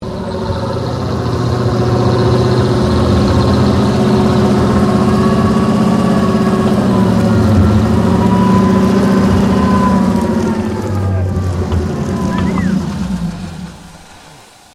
TANK BREN GUN CARRIER STOP, British Universal Carrier; Trucks (Sound Effects:Vehicles)